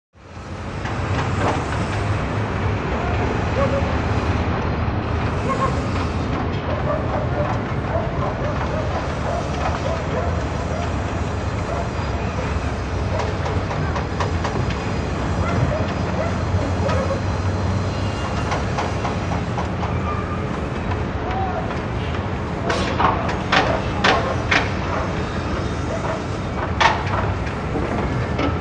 Construction Site Atmosphere; Atmosphere From Top Of Construction Site. Building Activity With Traffic Background.